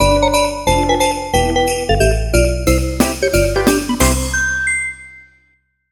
jingle short

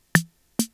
clave

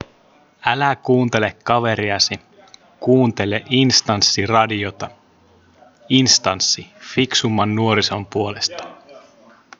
radio jingle

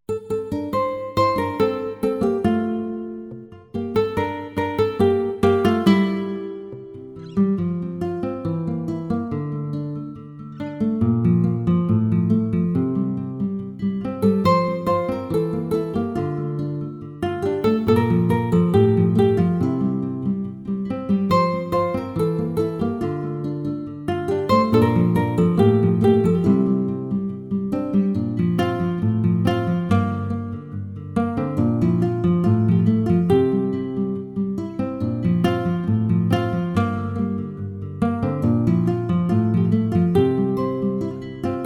guitar

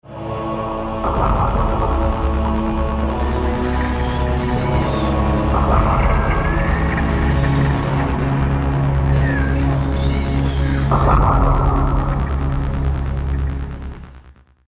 strange jingle

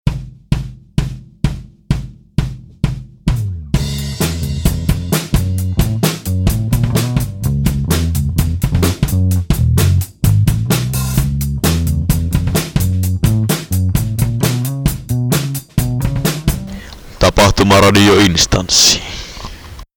jingle

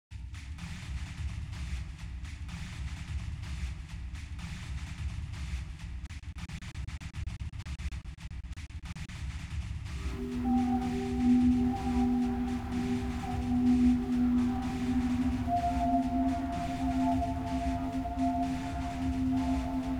distant pad

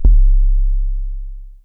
kick

